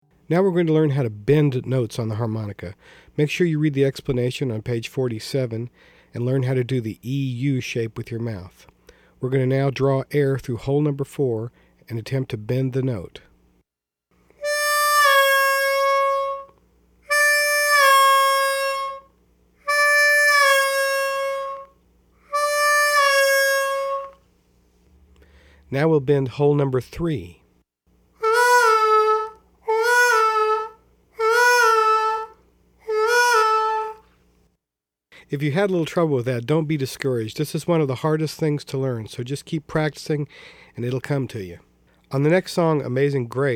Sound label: Bends